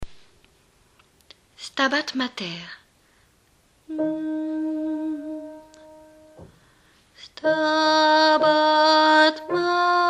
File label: stabat mater, alto